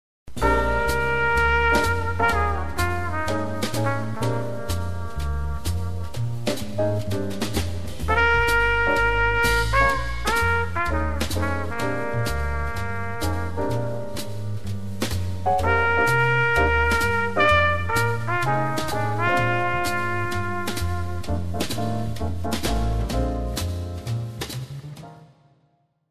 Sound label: BLUES, jazz, trompette